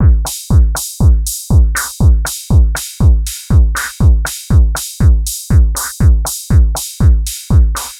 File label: 120 trance beat, Drum & Perc Loops